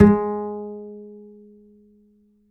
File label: Upright Acoustic Bass, 1ST POSITION; Larry Seyer